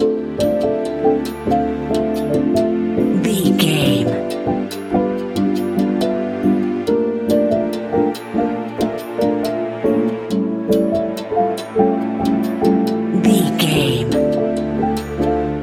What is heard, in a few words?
tropical luxury